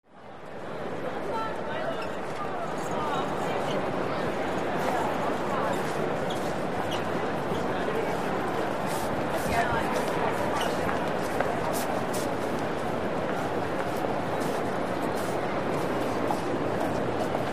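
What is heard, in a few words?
Interior Huge Train Station. Reverberated Footsteps, Sneaker Chirps, Movement, And Walla Close To Distant. Male P.a. Announcer With Train Info Medium Perspective.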